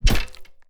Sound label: spear attack